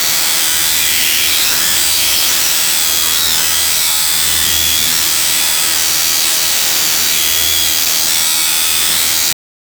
opearating system sound; operating system

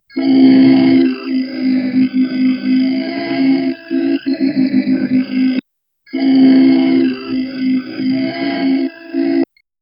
a cute robot that gasps

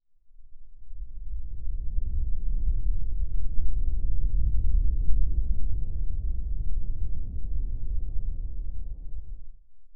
long cinematic desert win

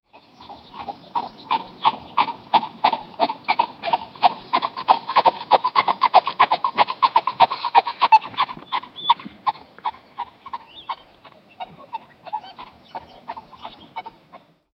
a horse making dog noises, barking

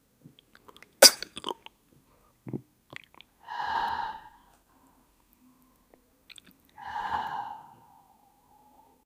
sip satisfied ahh, drink

